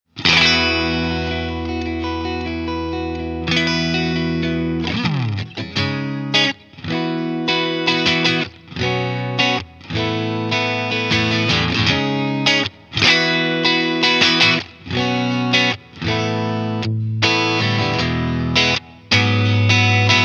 hx clean

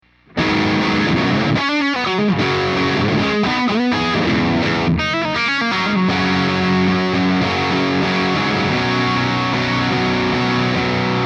LINE6 doom, helix amp model; Helixシリーズのマルチエフェクター

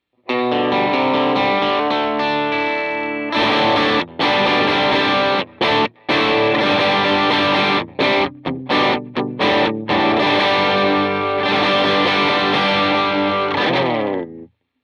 A30 warm brt, helix amp model; Helixシリーズのマルチエフェクター

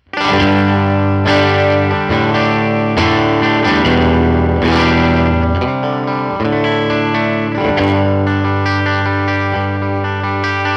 tweed blues nrm; Helixシリーズのマルチエフェクター; helix amp model

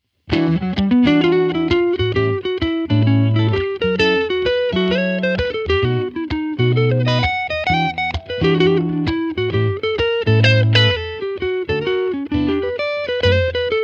JAZZ TONE

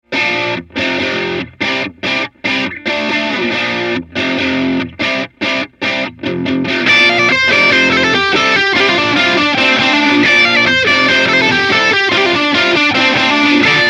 Pop Drive

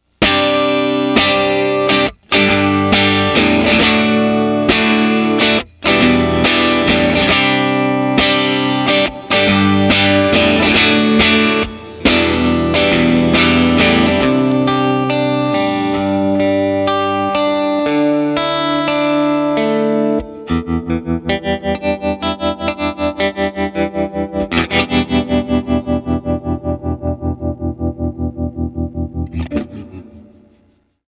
SING CLEAN; Helixシリーズのマルチエフェクター

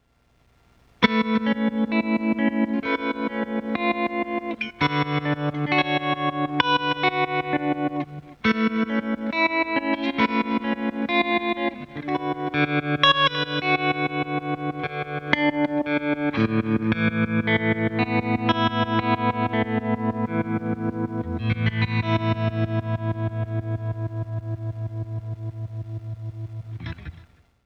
wave drama; Helixシリーズのマルチエフェクター

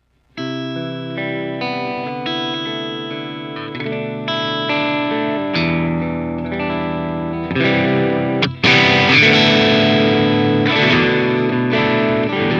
REAL TOUCH DRIVE

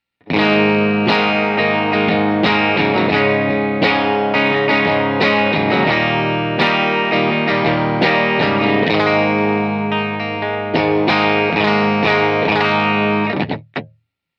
us super vib; Helixシリーズのマルチエフェクター; helix amp model

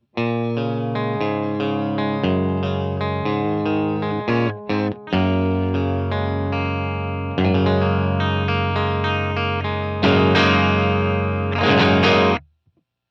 placater clean; Helixシリーズのマルチエフェクター; helix amp model

